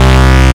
Bass Cuts; Vengeance Samples; VES1 Instruments

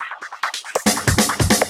Fill 140 BPM

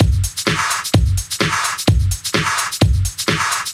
House Beat, 128 BPM

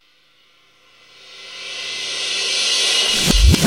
reverse, FX; Vengeance Samples

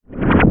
FX Scratching; Vengeance Samples

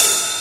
Ride, Cymbals; Vengeance Samples; VEH2

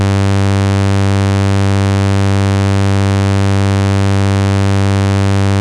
Moog Saw G2, Moog Voyager; Moog Voyager Sounds; VES1 Saw

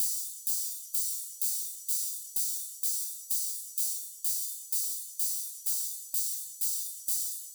127BPM Kit; Vengeance Samples; Com Pop Kit

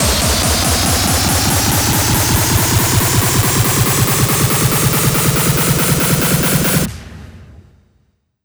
140BPM 4Bars, HandsUp & Trance Fills; Supadupa; Vengeance Samples

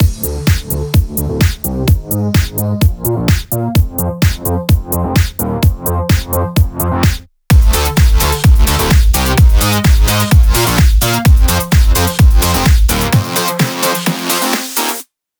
128BPM Mix; Vengeance Samples; Timebomb Kit

